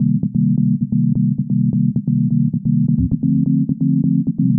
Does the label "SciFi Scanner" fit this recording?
No